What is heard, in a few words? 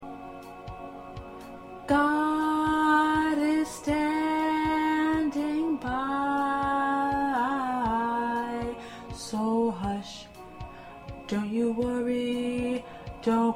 Lead